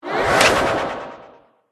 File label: attack voice middle